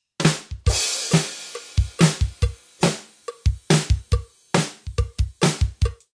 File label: drums